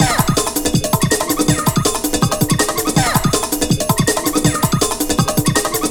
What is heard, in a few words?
Jungle, Loops, breaks; m8 breaks